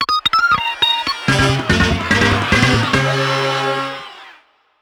Jingle, You win!; rayman raving rabbids playstation 2

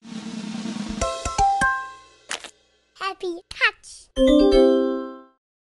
Logo; Carwash by Happytouch

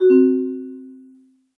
Sfx Golf

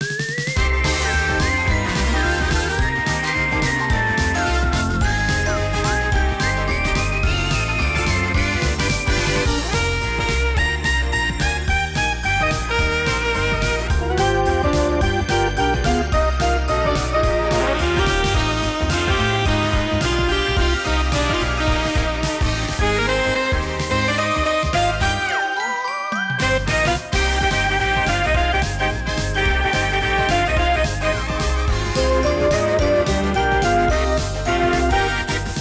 B Menu Event; dankira boys be dancing

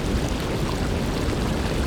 Initial Water Rumble Loop

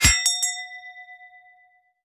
Bell Hit; Hollow Knight

